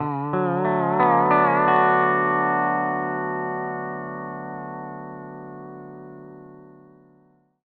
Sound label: PD LOOPS 140, Whole Lotta Country